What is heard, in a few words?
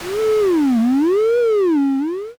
door creak open